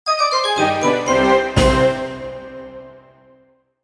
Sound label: Oh Well... (Level Failed); Kersploosh; 3ds gamerip 2011